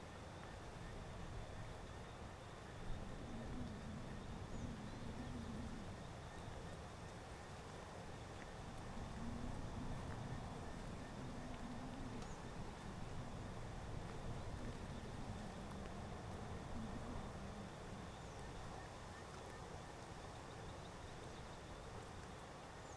Estrada de terra com vento grilos BR longe com transito; surround 5.1

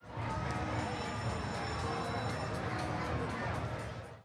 Sino de Aviso em Parque de Diversoes

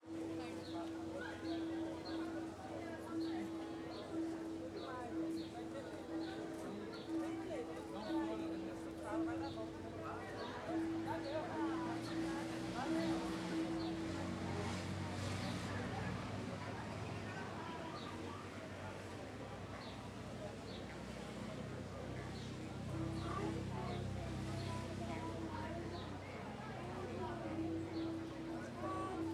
Ambiencia na Feira de Alto Paraiso perto do Centro de Atencao ao Turista

